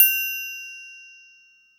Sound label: wink, Triangle; Misc Percussion